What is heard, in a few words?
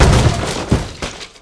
barrel break; Fate The Cursed King; ITEMS